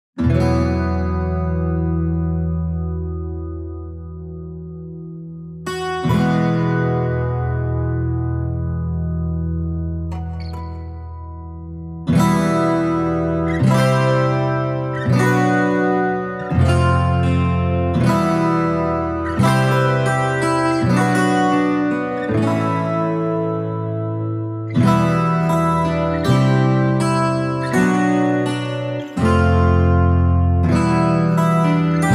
solo guitar

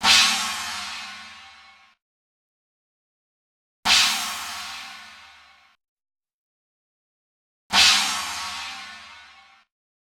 H910 harmonizer; Eventide

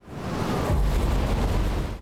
dry tsunami; ten tsunamis

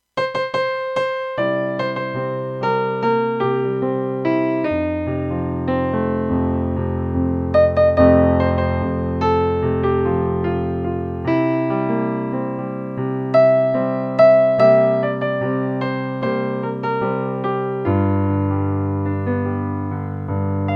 alt-tuner i-iv-i-v song fragme

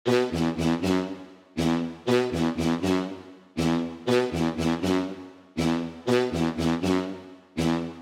serum preset brass small

